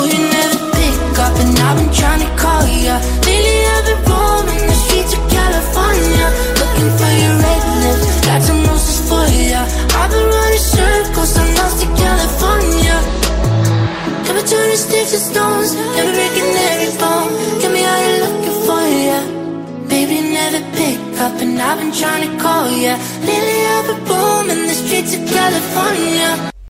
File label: romantic english song ringtone